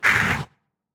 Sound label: CRAWLER damage